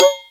Triangle, Yamaha TG-33; Drum Samples

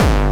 Bombo Hardcore, kicks

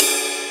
Ride, Yamaha TG-33; Drum Samples

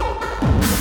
Hard Kick

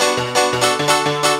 crap pianos

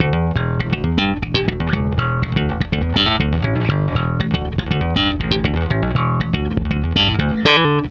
Spikey Funk, Bass Drum Kicks

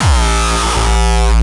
kick; shock. Ultimate Kicks vol. 2

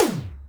Yamaha RM 50 Toms

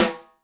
snaredrum, Yamaha RM 50

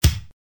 kick

